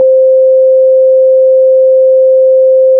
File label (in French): note sinus do